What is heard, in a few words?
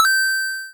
Level Complete; webglplatformer